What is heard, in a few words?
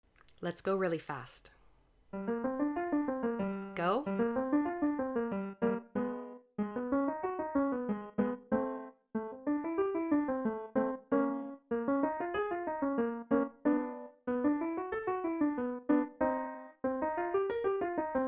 jazz even faster